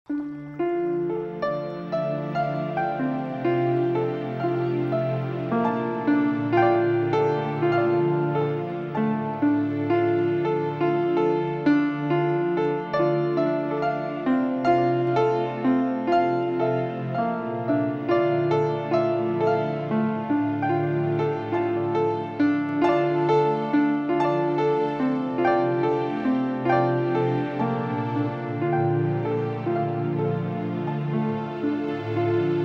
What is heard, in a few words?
Piano Love